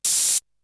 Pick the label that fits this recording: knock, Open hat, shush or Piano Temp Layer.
Open hat